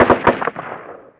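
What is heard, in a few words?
gun ak47 distant; ModernWarfare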